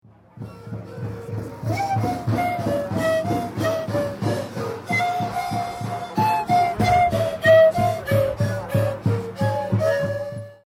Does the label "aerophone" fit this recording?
Yes